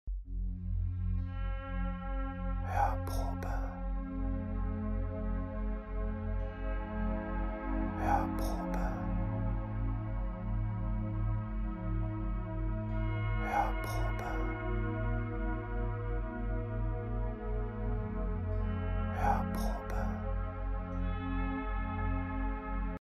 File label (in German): Hypnosemusik; Potenzialwecker